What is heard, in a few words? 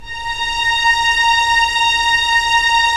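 Strings Violins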